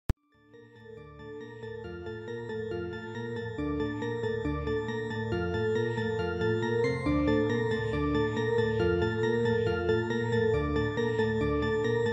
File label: rap beat